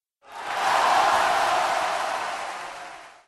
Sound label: goal